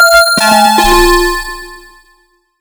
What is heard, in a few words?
Retro Weird